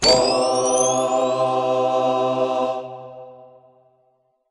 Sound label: you win; crazyeights